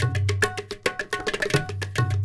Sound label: INDIA L; Spectrasonics - Heart of Asia CD2